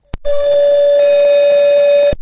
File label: Computer Alarm Tone